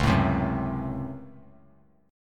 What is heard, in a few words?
C sharp Major 7th Suspended 4th Sharp 5th, C sharp; C#M7sus4#5 strummed; JGuitar